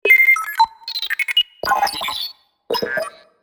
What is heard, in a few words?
Futuristic data calculations medium